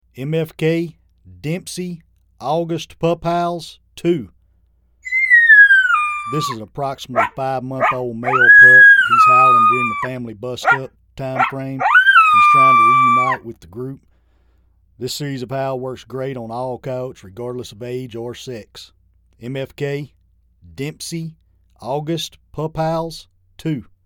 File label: Pup Howl, game calls; MFK Dempsey